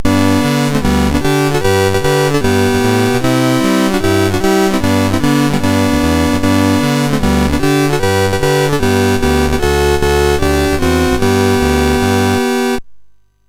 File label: Rocket Ball, MOS6581R4